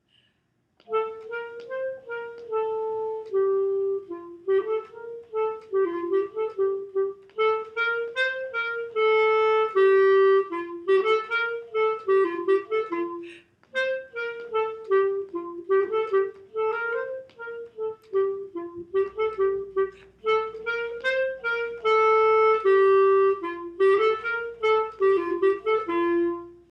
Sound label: gavotte